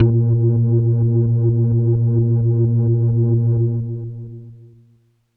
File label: Leslie As-1; organ; Kawaii